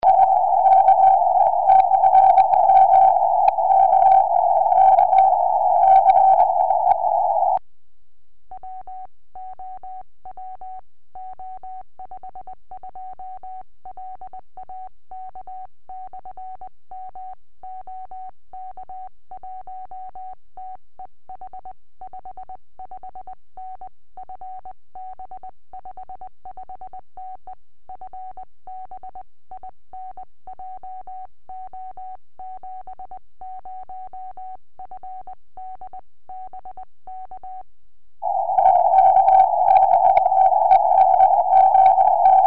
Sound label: 70cm, tropo; a najednou mě v rychlém tempu někdo volá; OK1TEH DE OH2LAK/M